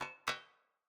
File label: graphics robotic tick; soundscrate